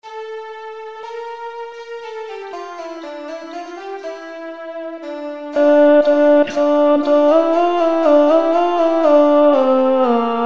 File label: depos Ten